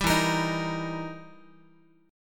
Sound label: E Minor Major 7th Flat 5th, E, down, Guitar